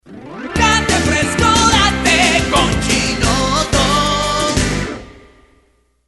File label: ROCK